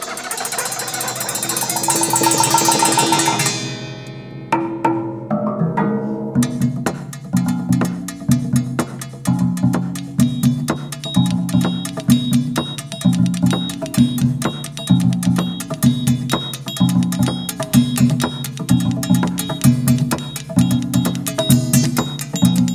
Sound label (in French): structures sonores baschet samba; c.tardieu